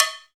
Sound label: CowBells